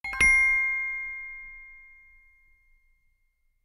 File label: Bells, ringtones; Meitu T8; notifications